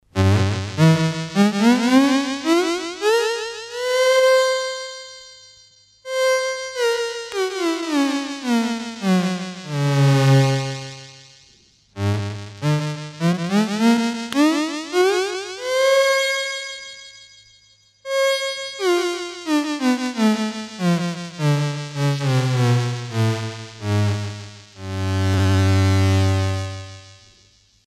ascend + descend seq ii, Electronic Sound Patterns; His Master's Voice